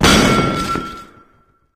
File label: drop; video games; player; taunt; soviet strongarm; source engine; tf